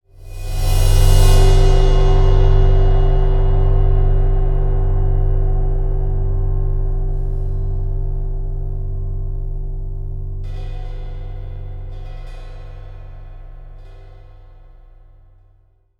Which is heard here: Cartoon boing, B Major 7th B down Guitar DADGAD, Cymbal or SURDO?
Cymbal